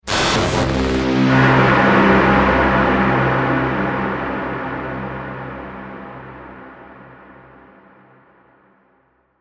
Radio Stinger; sound design; Stinger Sound Effects

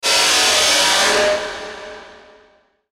static flange; Static Flange Reverb Sweep; Production Element Imaging Element Accent Transition; Blastwave

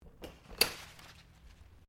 Umbrella Close; Umbrellas; Objects

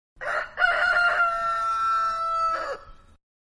Rooster; Birds; Animals; Manhattan Production Music

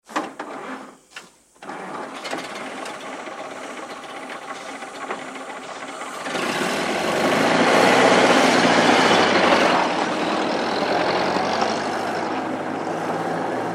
Car Very Old, Start and drive away; Cars; Vehicles; Tom Hutchings

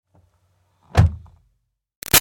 Car Highlander Door Interior Close; 2005 Toyota Highlander V6; Slam; Cars (Sound Effects:Vehicles); Big Room Sound

